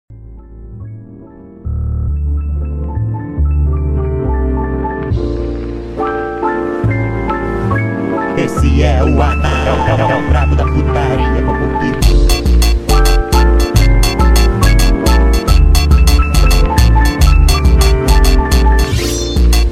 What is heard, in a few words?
Brazilian Loop - Slowed